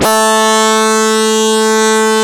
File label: GTR SYN LE, Gtr Syn Lead; E-MU Producer Series Vol. 2 – More Studio Essentials